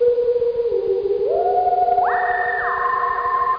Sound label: spooky